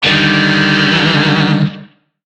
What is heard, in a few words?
Shawzin Min Chord E; Warframe; Lizzie Shawzin; Chords